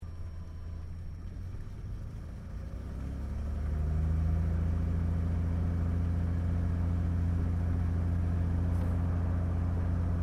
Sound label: AIRCRAFT CT4A TAXI INTERIOR; CT4 military trainer aircraft; Airplanes; Vehicles